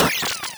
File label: wpn laser, arcade game sounds, Weapons, Lasers